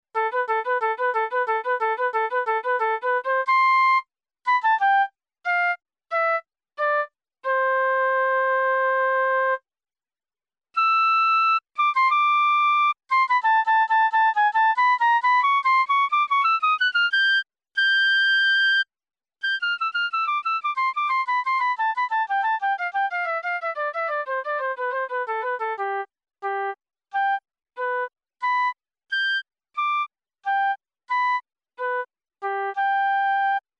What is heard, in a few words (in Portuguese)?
flauta doce